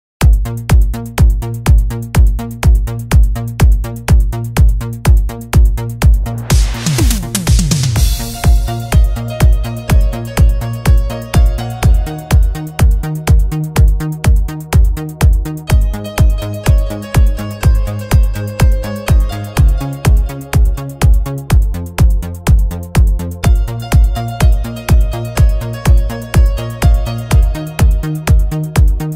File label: new italo disco instr cover